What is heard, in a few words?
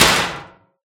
metal impact light thud; SFX Environment ProSoundCollection v1 3 Metal; Poppy Playtime